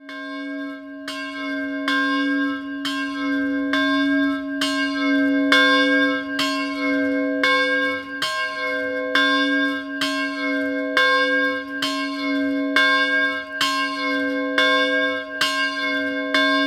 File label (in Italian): campane; Cimano